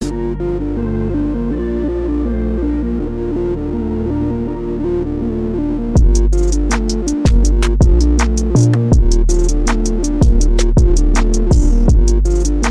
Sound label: Free Beat